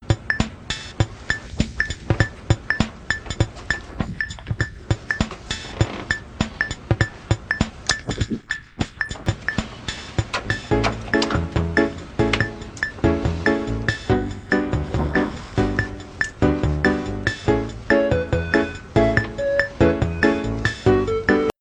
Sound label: bossa nova; the office